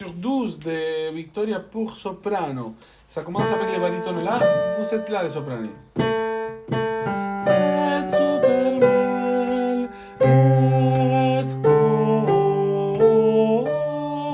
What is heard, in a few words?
Jesu dulcis memoria soprano; mesures 12-17; Victoria